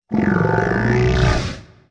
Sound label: Dinosaur Design, Vocals - Monster; Sony Pictures Sound Effects Series